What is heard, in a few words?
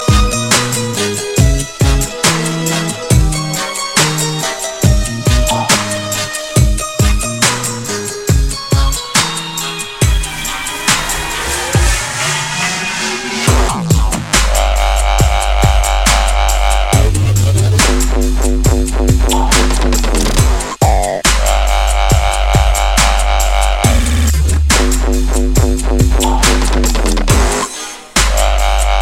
16bit dubstep